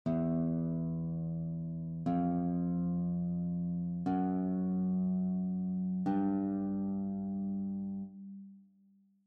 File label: Low E string, guitar; childrens